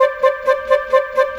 Cinematic Winds; Sonic Foundry (Sony Creative Software); Orchestral Series VOL-3; Cinematic 21

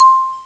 High Vibes, Amiga Soundtracker; ST-XX Amiga Soundtracker Sample Packs

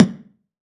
BD, BASS DRUMS; EdgeSounds

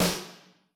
Snare, Yamaha RY20

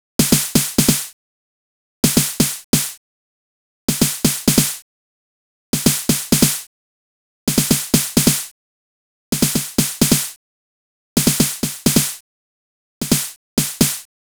Snare, EDM; Sonic Foundry (Sony Creative Software); Afterhours EDM

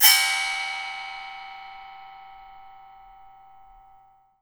RECO RECO; Drums&Percussion; E-MU Producer Series Vol. 7 – Old World Instruments

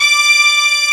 BRASS MIX; Best Service Advanced Media Trax Modern Composer

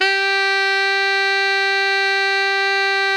TENOR FF G 4, saxophone; Greytsounds; Philip Wolfe David Crozier's Saxophones

